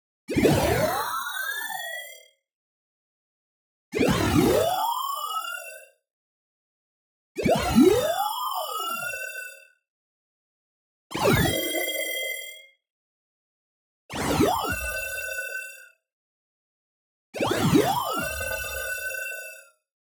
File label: tonal, talking long; boom library; Anime Essentials